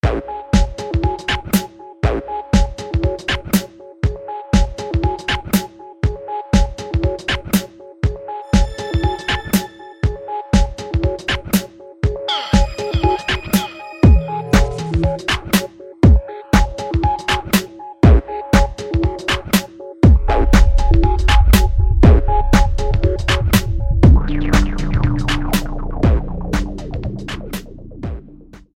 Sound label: dreamy song